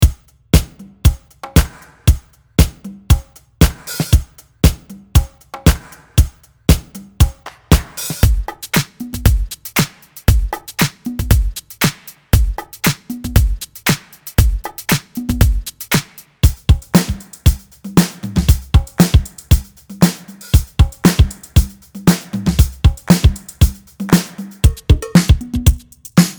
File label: disco funk